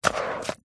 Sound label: household mop single wipe movement on hard floor